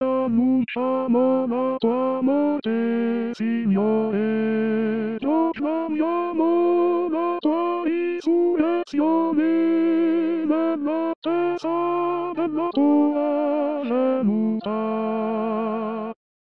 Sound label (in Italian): Bassi